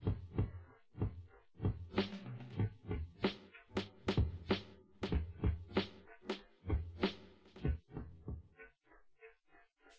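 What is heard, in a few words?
with drums inst sim; SASSEC07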